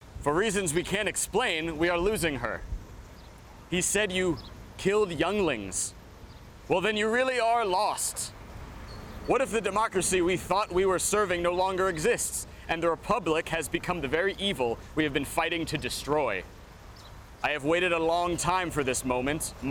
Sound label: Generator Lav; CEDAR; cedar-demo